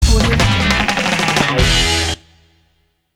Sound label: heavy rock ident